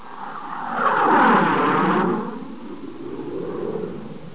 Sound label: jet